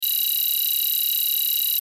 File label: DATA READOUT FAST, Sweeper, Digital, Bell, Distortion